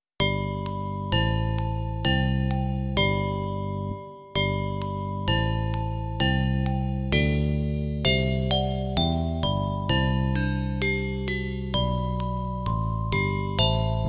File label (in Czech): zvonkohra a baskytara; Imaginativní verze